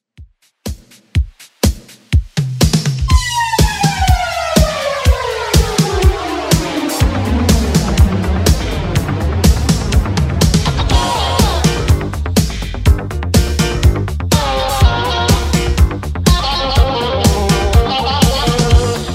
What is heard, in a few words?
80s Redrum 123bpm